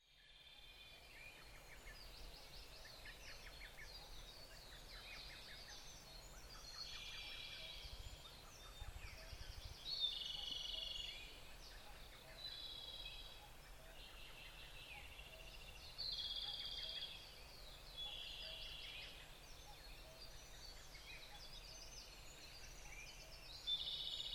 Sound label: chorus, bird